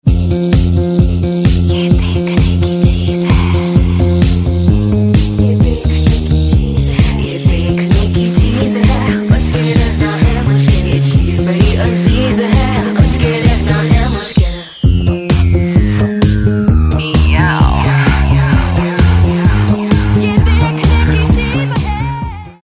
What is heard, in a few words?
TV ad song; Intex Aqua i 5 HD; Your Name Ringtones A To Z Names